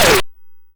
YM Shooter; SFX; CHIPSHOP DELUXE; CHIPSHOP HITS

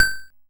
Xylo G3; multi-samples; CHIPSHOP DELUXE

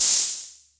Jomox Hat

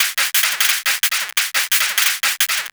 PO Perc, 175 BPM; loops; CHIPSHOP DELUXE

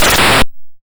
YM Noise; NOISES; ChipShop Deluxe; ChipShop Hits

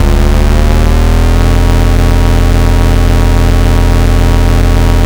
YM Engine Room; SFX; ChipShop Deluxe; ChipShop Hits

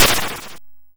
Snare, YM, CHIPSHOP HITS DRUMS; ChipShop Deluxe

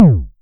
Vermona Kick

